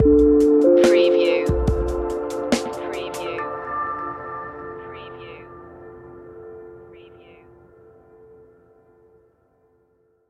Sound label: emergent lights stinger